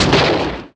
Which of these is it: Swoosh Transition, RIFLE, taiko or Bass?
RIFLE